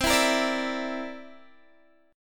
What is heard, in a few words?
C 9th C down Guitar Open D